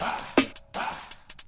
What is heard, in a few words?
Loop